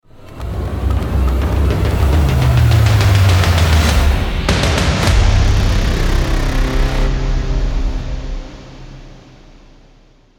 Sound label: cinematic bang